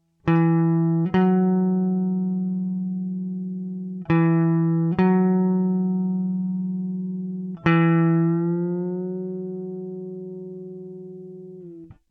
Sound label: bend